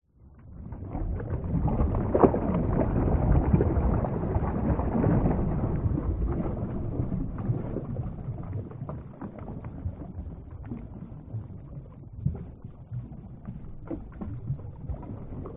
water underwater movement; nature

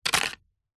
leaf dry fall onto concrete

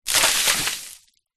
nature water splash hit concrete ground; zapsplat

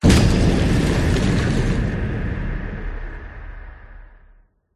water whoosh impacts movements; george gazetis

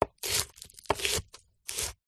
food onion raw skin on slice chop knife

